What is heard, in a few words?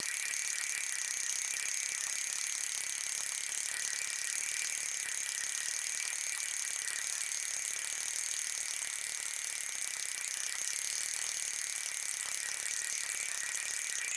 knock